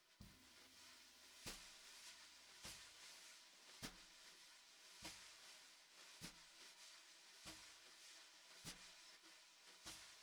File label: SNR